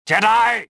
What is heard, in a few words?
anger; reborn